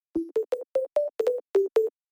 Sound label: dry lick